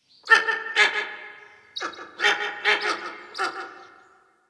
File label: Ambient Bird Calls; Warframe; Duviri